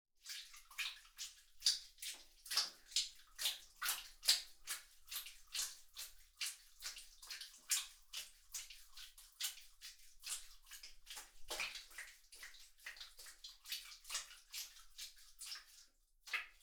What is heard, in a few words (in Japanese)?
クチュ音 棒 中速; 3DIO; アダルトな音